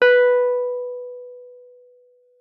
WUF B4, WURLITZER; Xsample VOL-1

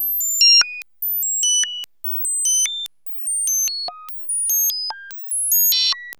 RHYTHM ODD

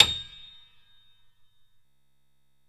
g#7-c8, piano, grand; Giga Samples Collection; ARS Grand

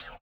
slip slap; Spectrasonics; Metamorphosis

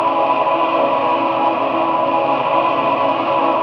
vocoders; Sound & Vision - Gigapack I CD 2

